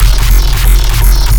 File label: Sound FX Bass